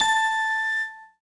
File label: Tone; lego my style preschool